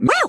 "woo", voice

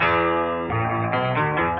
piano, Loops; Phonky

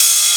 Hats Electro Open

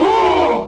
huh group of men; ooh ahh; heh huh

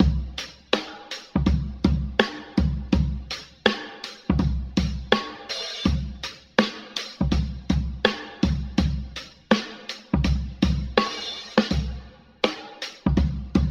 boom bap, boombap drum loops

